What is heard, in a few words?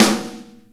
SNARE, DRUM KIT; 300 Drum Machines; Zoom RT-234